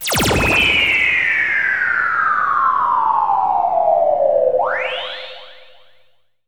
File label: BOMBS AWAY, LASERS STABS; ILIO; Trance Fusion